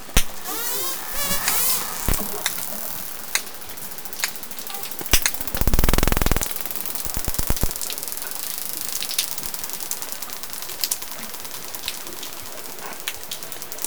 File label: clicks and groans